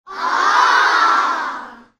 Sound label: CROWD REACTION